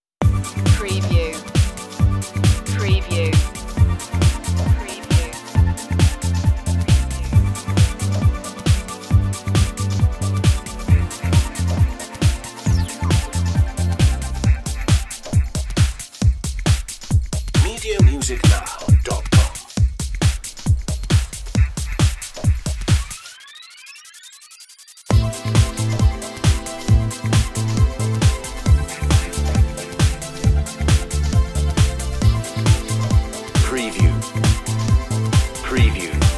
house

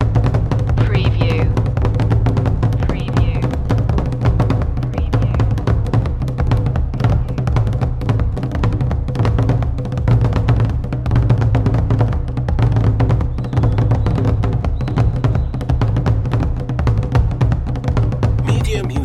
african drums tembea loop

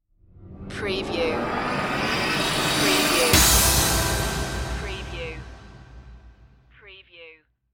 tv punch stinger

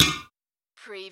Button Sound - Metal Ding

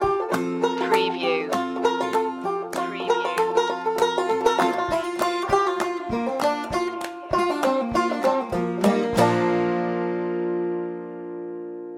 barnwood banjo

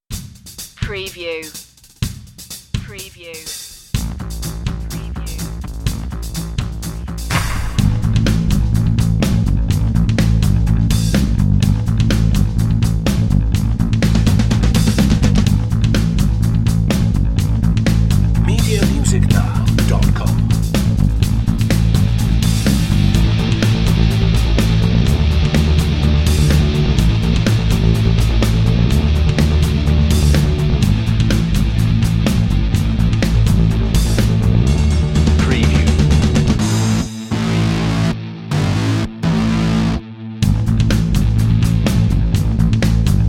slow burn dnb

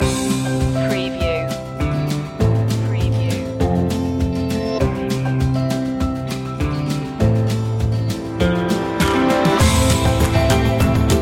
walk together no vocals

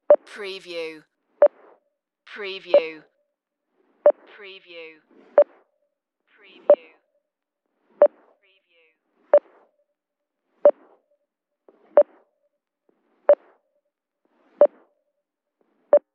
Police Radar Beep

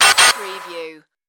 starship door access

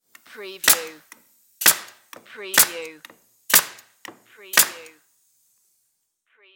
Brad Nailer Pneumatic; sound-effects-bible

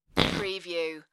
Fart

